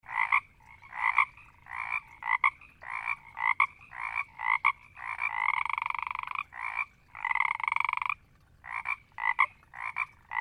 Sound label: Frogs in a marsh croaking and growing slowly